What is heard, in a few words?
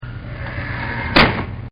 SHUT DOOR; Doors General; Miscellaneous Doors